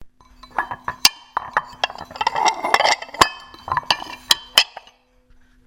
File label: Pottery Ceramics Bowls